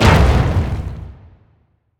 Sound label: impact; smite; cabrakan